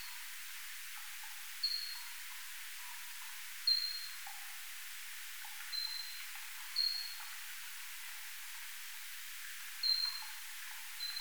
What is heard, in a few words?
Pipistrellus abramus